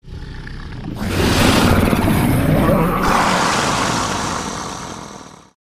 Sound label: Monster Combine, monsters